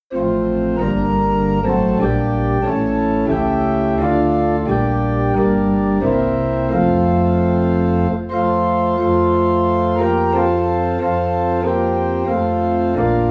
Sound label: Organ in F; Away in a Manger; christmas